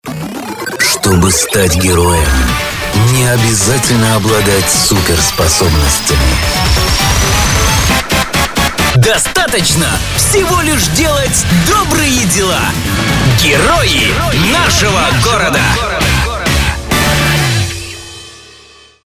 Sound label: JINGLE; HERO OF CITY